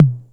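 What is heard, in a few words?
TR 606, drum kit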